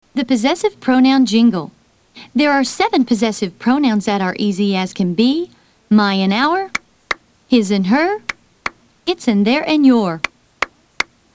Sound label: possessive pronoun jingle